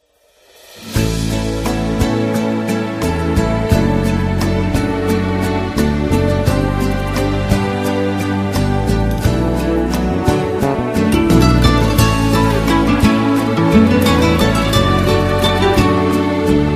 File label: rumb